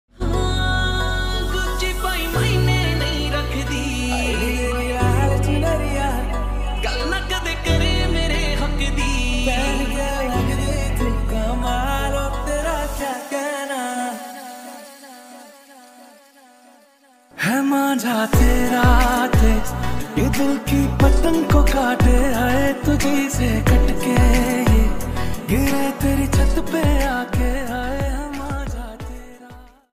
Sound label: mashup panjabi ringtone